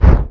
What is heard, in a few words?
fire FAIL, magic; The Elder Scrolls III - Morrowind; Morrowind GOTY GOG